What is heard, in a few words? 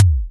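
synth kick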